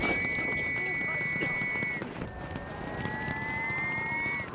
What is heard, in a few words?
whistle; bf1